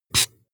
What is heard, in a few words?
marker mark hard short